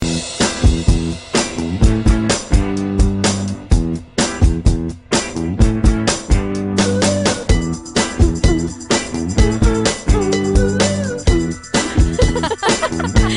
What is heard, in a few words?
drums ringtone; i phone 4s